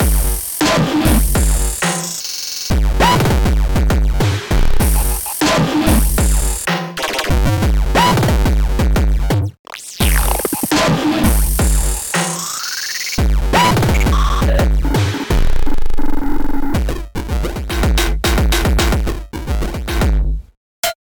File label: type beat